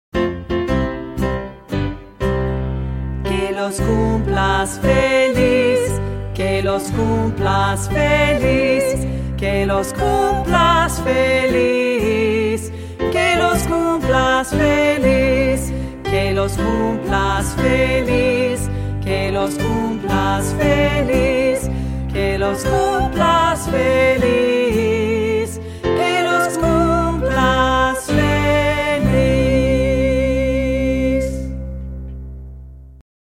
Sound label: Happy Birthday Spanish